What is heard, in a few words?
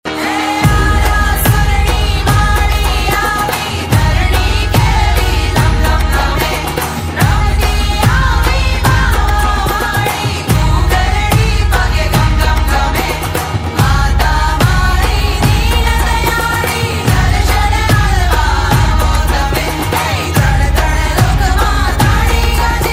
gujarati ringtone